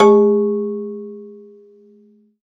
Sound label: Rubbery Piano, prepared piano; Total Piano